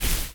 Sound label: Baseball Bat Bolt